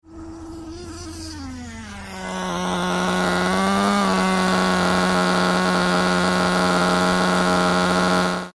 Car Nitro Powered Toy 3, Up and stop - single cylinder; Cars; Vehicles; Tom Hutchings